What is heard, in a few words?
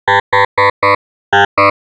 shepard with context up